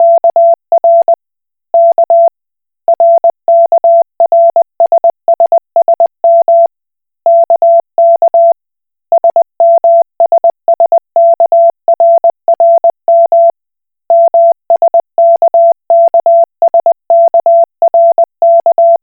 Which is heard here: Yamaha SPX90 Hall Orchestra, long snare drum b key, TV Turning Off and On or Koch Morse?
Koch Morse